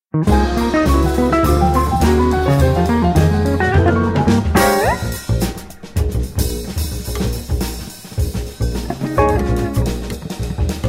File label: Jazz Dance